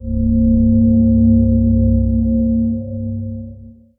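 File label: when quest starts low frequency, undertones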